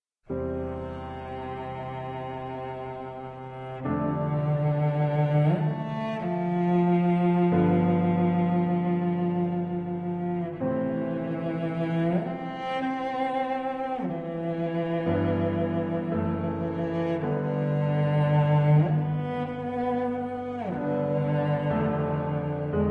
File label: Adagio For Cello Piano; Kodaly